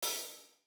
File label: HI HAT CLOSED MED HIGH; vintage console bundle